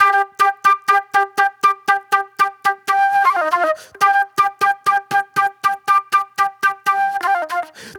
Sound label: Super Soul Flute